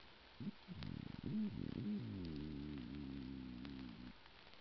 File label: E guttatus